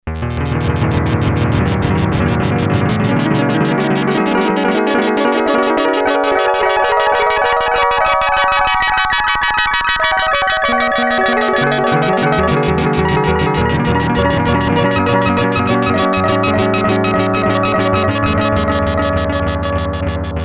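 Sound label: synth japan, analog polysynth; Solton SM100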